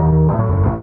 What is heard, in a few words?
ORG RIF; E-Lab; X Static Goldmine 2; akai